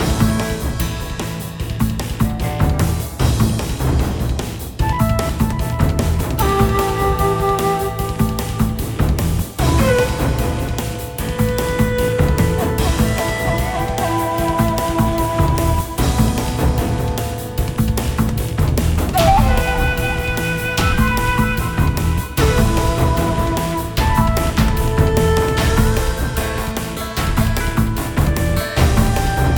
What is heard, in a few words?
bgm loop; hidden dragon legend